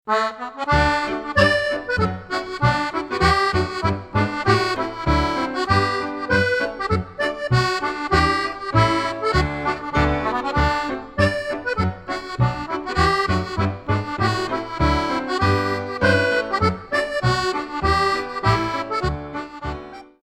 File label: accordion